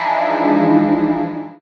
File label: Harsh